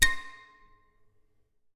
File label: Classical Guitar Extended Technique Plink